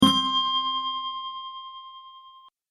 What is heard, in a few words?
Wobble Ding Notification; Bell Sound Effects